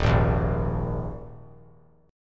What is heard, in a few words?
D sharp Suspended 4th; D#sus4 strummed; JGuitar